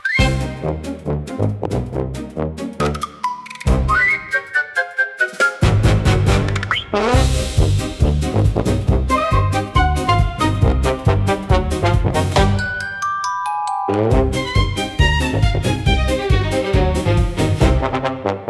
Tuba Tumble Chase, comical orchestra for slapstick gag scene